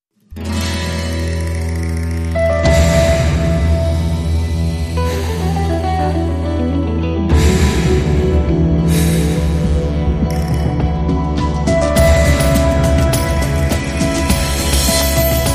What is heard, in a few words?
Spanish Heart Beat